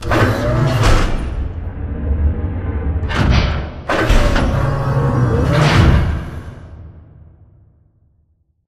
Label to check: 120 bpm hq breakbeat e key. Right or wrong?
Wrong